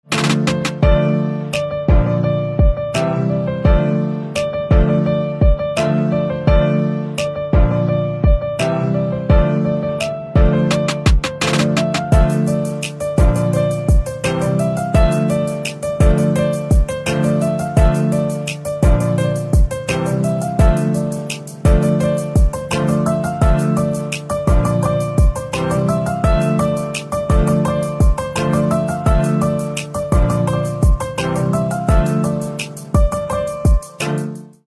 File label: Piano Instrumental Ringtone; punjabi instrumental ringtone